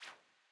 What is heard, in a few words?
swing; gnome-control-center